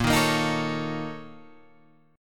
Bb Minor Major 7th Double Flat 5th, Guitar, Open G; 8,8,8,8,10,11